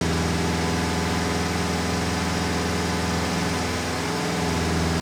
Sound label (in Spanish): car motor andando rapido; Steven Universe - Beach City Drifters